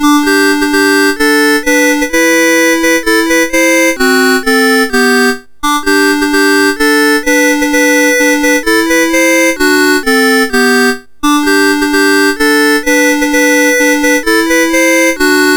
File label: MOS 6581 R4